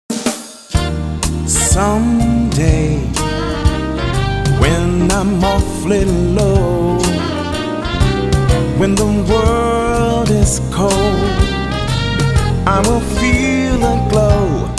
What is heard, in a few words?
Variety Band; Washington DC